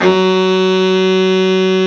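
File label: baritone sax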